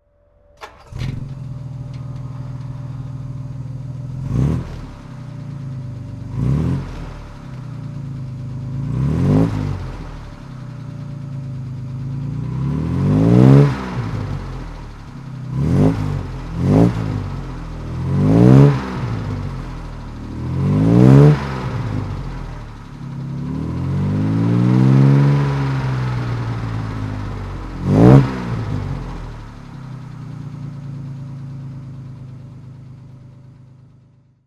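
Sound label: jaguar xk150dhc automatic throttle, Engine sound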